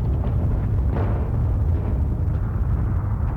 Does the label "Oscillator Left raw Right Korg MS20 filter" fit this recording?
No